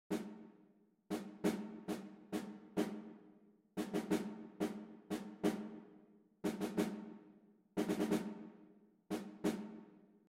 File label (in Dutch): dictees